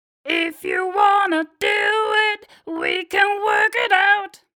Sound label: growl; CVT